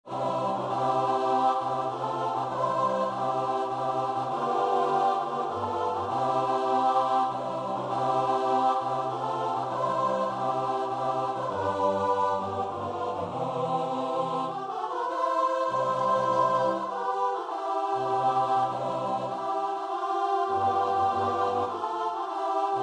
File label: choir; i will sing